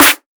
Snare Natural Bass House; LIFEFORMS

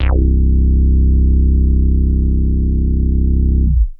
Big Synth Basses

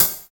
HAT; East West - Phat & Phunky CD2; 90's Sample CDs

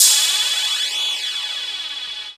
125 DRM, Fresh Disco House

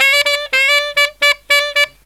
SAX, Killer Brass Riffs

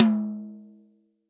REAL DRUMS POP KIT

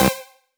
Synths Root C

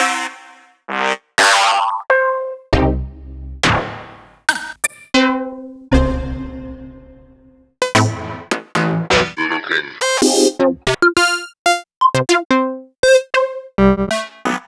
Synth Sounds

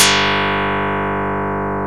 HARP KARPLUS

